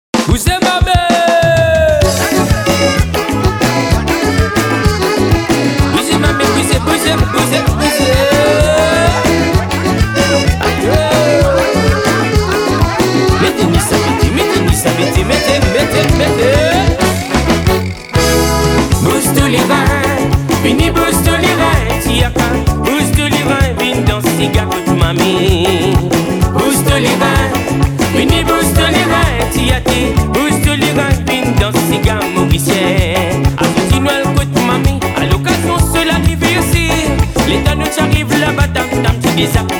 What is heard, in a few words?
SEGA TAMOULE